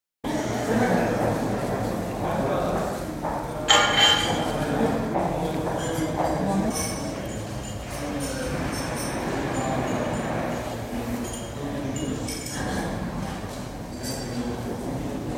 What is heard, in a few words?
Spain: Large Conference Room, general chatter & clatter of cups during coffee break, Very live acoustic recording; Building Sound Effects; BBC